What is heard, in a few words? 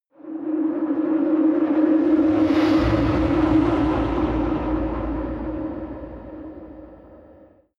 whoosh scifi hum